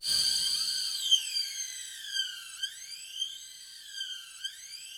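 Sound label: Yamaha VP-1, rough strings G5; 90 s Sample CDs; Music Store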